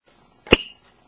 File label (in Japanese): pikopiko; 着信音やアラーム（目覚まし）音に使える、着モーションとして; FOMA